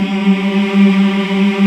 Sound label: VOX African; Roland LCDP11 Africa VOL-1